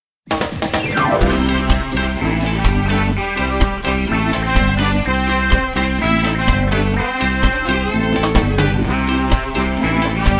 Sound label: Reggae